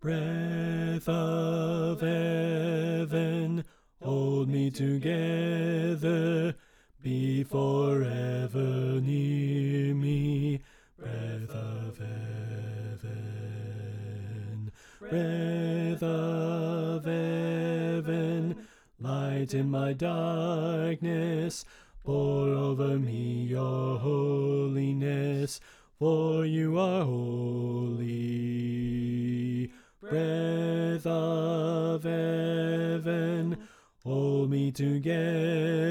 Bass Left

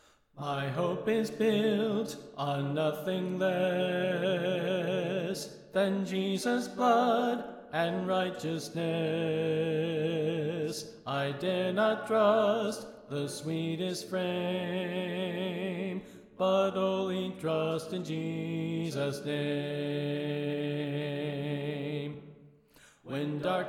Soprano Left

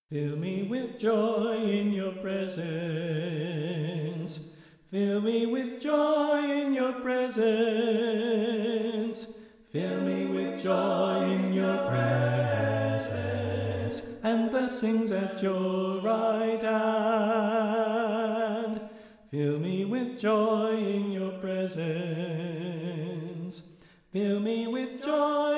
Bass Left

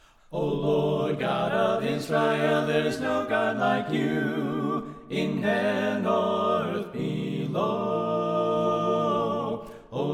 Soprano Left

